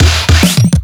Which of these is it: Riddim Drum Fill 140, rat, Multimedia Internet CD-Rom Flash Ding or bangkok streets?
Riddim Drum Fill 140